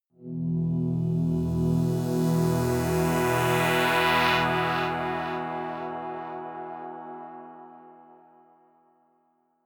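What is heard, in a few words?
eighties fifth short, pads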